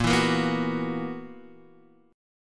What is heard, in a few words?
B Major 7th Suspended 4th Bb